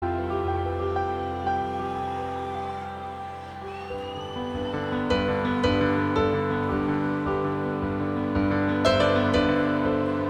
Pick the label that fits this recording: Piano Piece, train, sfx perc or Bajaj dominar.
Piano Piece